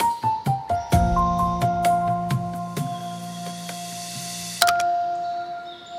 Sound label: jingle